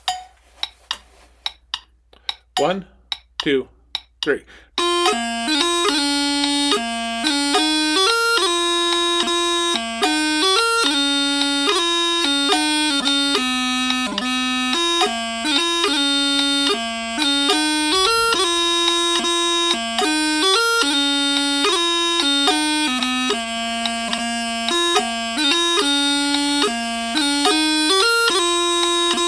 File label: 72bpm, Chanter